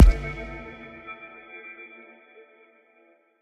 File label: Impact Inside Glitch; Game rip; MiSide